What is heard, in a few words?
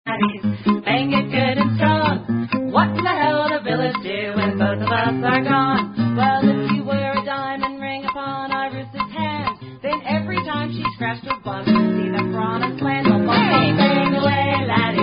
Female Version